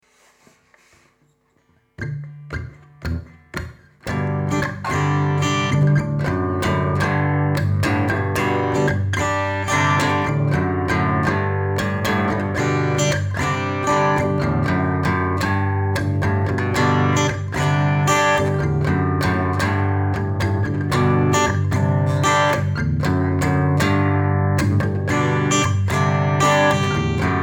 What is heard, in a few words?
G GM4 F G Em C